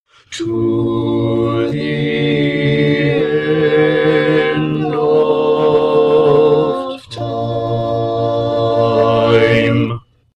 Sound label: Bass, barbershop